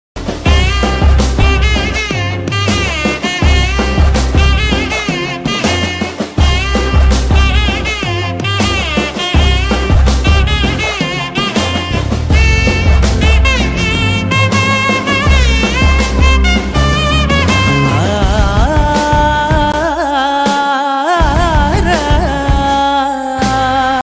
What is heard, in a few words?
song ringtone malayalam ringtone psycho bgm; kantara movie